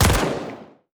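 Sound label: black cannon, attack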